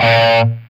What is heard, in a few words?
SOLDANO ROCK, Guitars